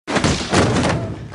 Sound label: hit